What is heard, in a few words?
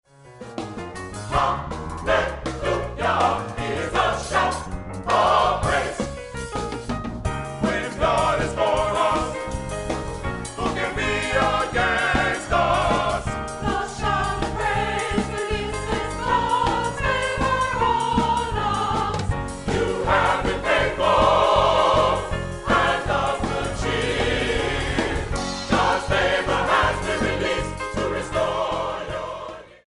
shout